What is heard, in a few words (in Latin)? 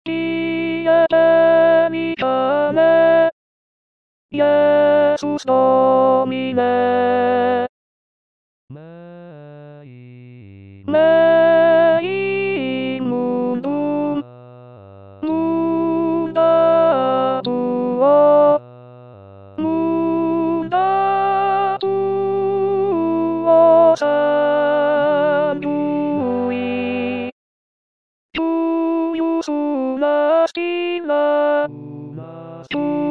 pie pelicane alto